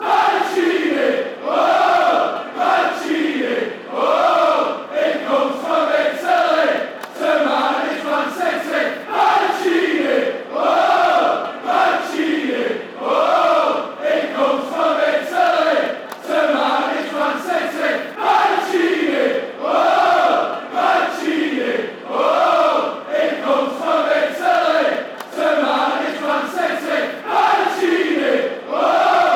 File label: woah, chants; mancini